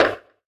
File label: step; HeavenStudioPlus; marching Orders